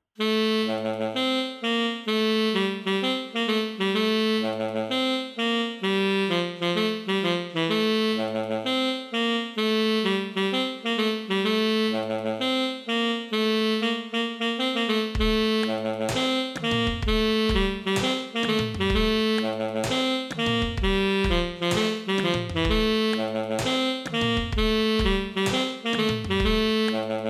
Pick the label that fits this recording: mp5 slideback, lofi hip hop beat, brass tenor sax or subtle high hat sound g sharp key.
brass tenor sax